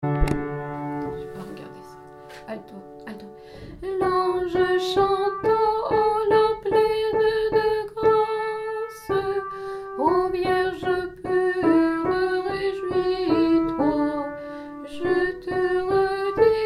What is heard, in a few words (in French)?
Alto; Lange chanta